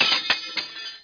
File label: break